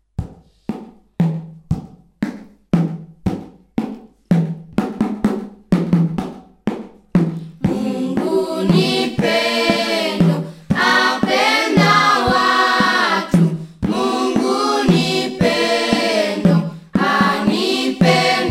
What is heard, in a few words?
Bethany choir